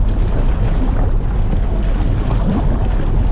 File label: Lava Bubble Loop; Destruction